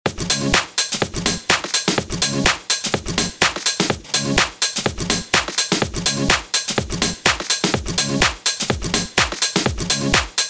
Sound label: House